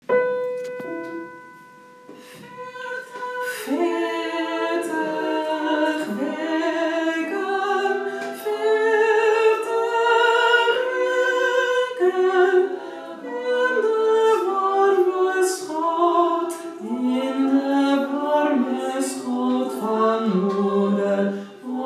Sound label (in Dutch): Veertig bas